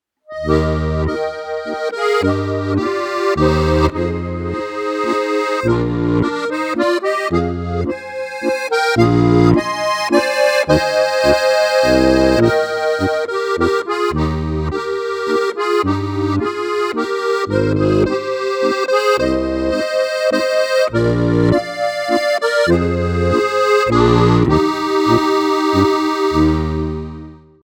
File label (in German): steirische harmonika tremolo schnell walzer; TA20X; mikrofone akkordeon steirische harmonika; Rumberger